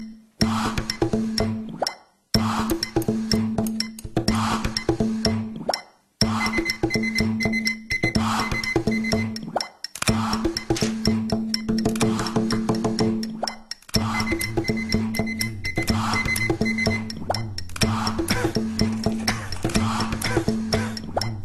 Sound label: Bongo; Scrubs